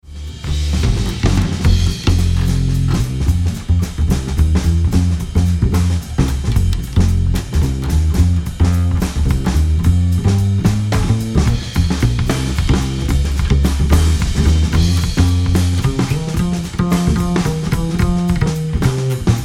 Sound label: Mix Bass Bus